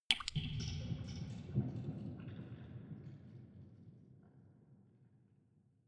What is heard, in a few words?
dripping